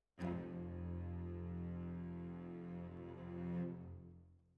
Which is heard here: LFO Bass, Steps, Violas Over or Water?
Violas Over